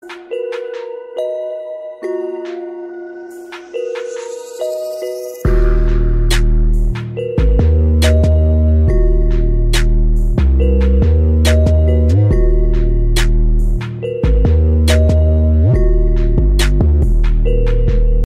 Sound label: Type beat